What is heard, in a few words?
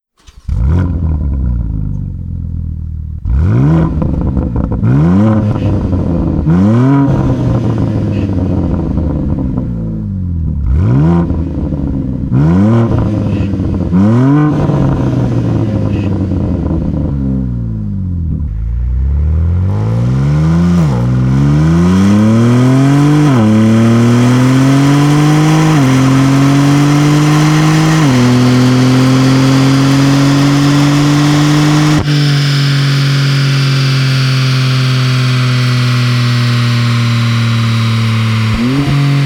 BMW G80 M3; Remus